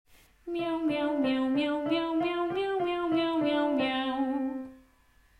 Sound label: Crying Miau